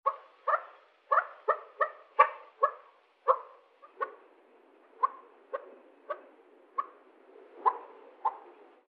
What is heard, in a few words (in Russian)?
golos samki perepela